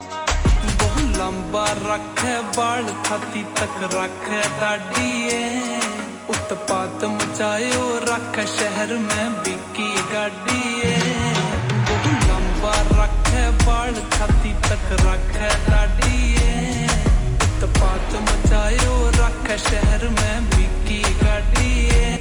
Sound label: Slow Reverb Version Ringtone